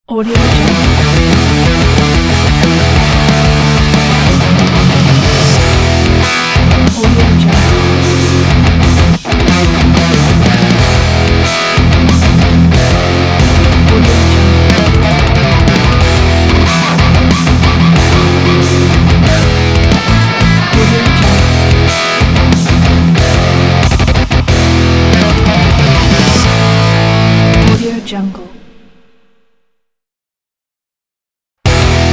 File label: metalcore breakdown